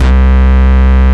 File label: Ultimate Bass Root C; Vengeance Samples